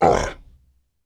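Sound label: dragon hurt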